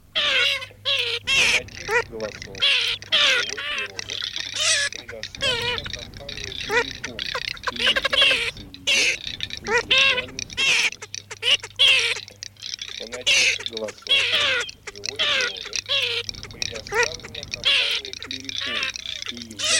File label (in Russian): Чирок-трескунок Marzaiola; plurifon